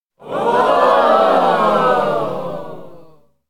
cheer hype ooo; anemoi

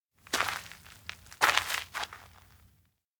steps outdoor camp slow; koyomi; anemoi

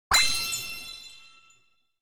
elec wink pachiin; anemoi

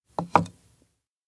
pizza plate offer; anemoi

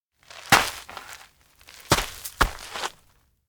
steps outdoor forest step out; anemoi

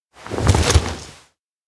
bring campgear; anemoi

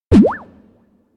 elec chest bump poyon; anemoi

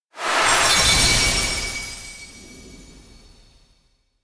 elec light pop; anemoi

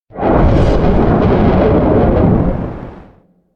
elec black wind roar; anemoi

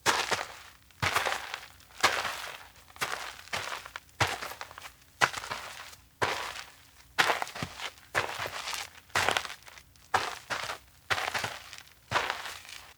steps outdoor forest walk; koyomi; anemoi

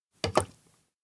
cookoff meatball soup plate; aino; anemoi

